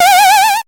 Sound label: kart shrink